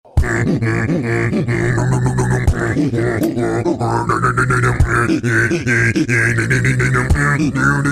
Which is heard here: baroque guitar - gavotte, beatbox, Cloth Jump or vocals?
beatbox